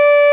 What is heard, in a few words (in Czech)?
flétna; KMITÁNÍ A VLNĚNÍ; hudebni nastroje; CHORDOFONY